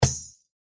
reelspin stop; Shopping Fiend

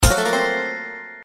hit; Egyptian Mythology

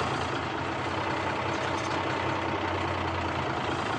enter loop; Wazdan

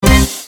bonus chance; All Ways Fruits

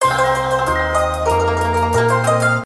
start; Beauty Warrior

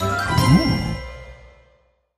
selected bear; Fishing For Gold

